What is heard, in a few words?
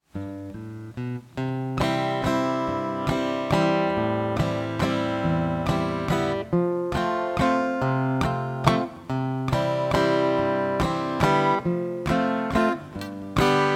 accompaniment